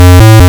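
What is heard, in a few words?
waveform FM square triangle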